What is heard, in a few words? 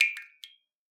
Drips